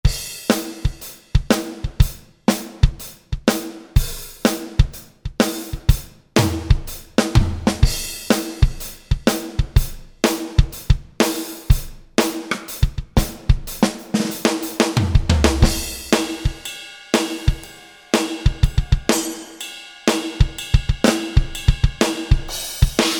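Standard Rock 1 KIT, Roland SRX-01 Dynamic Drum Kits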